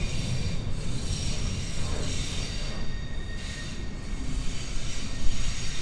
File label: Central Station, Rails; Düsseldorf; Christoph Collenberg